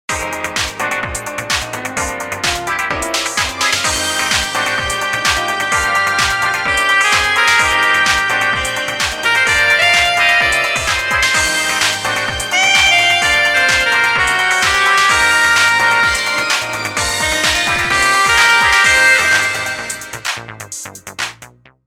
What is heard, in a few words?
Cheerful Vein